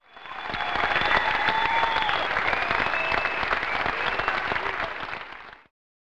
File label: Applause